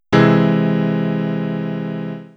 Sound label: C augmented triad, piano